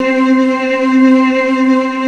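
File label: organ keys C3; D70 KEYS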